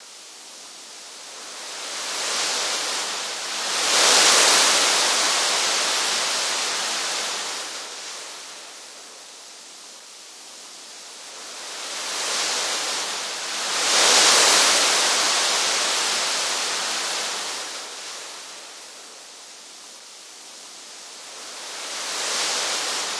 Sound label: Sound of Waves; Dreampad